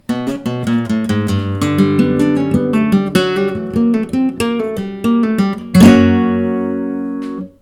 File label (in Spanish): falseta